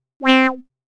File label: Wah C, synthlead